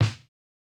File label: Korg snare; Electro; Micro korg